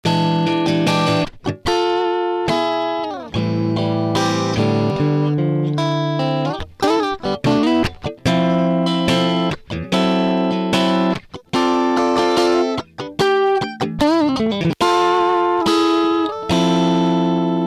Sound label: guitar new amp